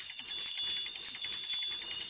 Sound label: win countup; hades River Of Souls Desktop; Fantasma